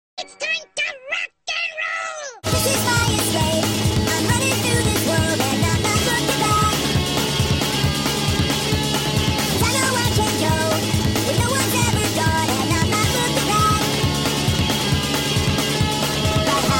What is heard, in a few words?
rock and roll